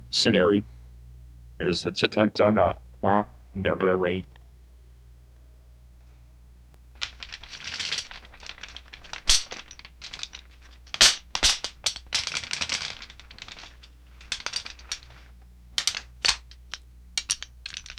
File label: deploying an ak 47 heavy